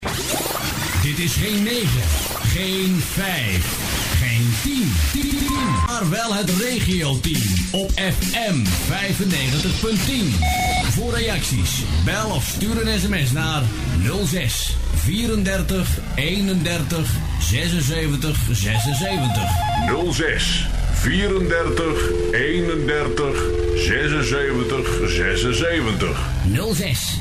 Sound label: Jingle; Audio Fragmenten; Arkan Radio 2